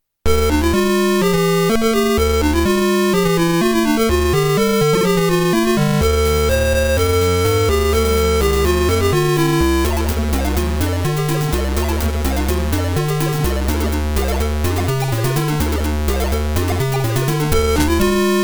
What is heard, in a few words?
ym2149; electro; aym